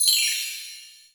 Kawai K11 Bell Tree

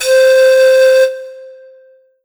Roland SC 88 Pan Flute C5

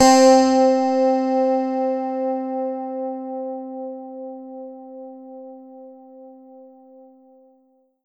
Yamaha EX5 Strat 4 Way C4

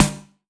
Yamaha FS1r BoomTchak Snare

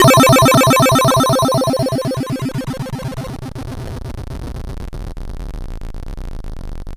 M-Audio Venom Disintegr8 C3

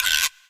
Korg N1R Long Guiro

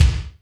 Kick Drum

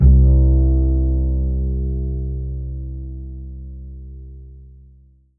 Korg 01W XFade Bass C2

